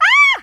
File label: fear